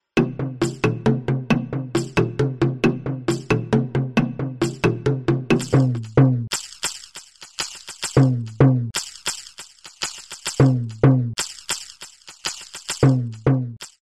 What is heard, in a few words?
african percussion set